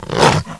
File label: Wolf Attack; Playable Tutorial Level; TR1